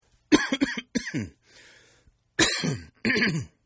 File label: Cough Male; Sickness Sounds; Human; Partners In Rhyme